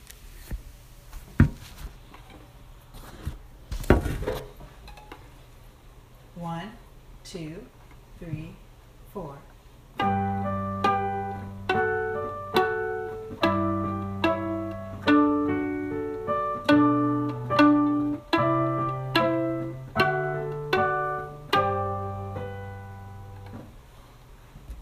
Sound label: violin viola